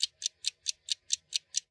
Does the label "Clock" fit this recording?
Yes